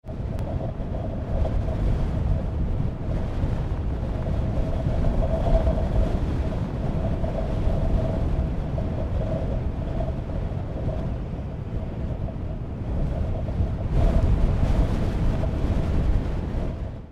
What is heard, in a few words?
Sahara desert large gust of wind blowing